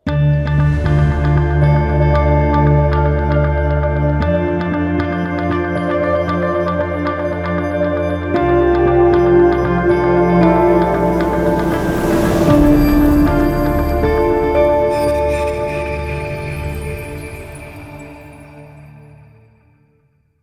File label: Station ID Modern; GEO Television